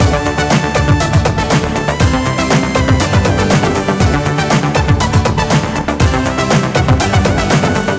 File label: Disco; j4g a girl s world 2009 nds